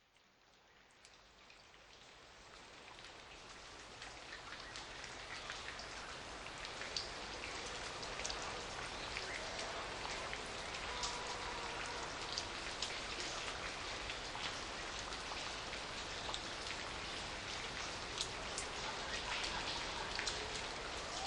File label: Tropical Rainforest Retreat Zen Spa Music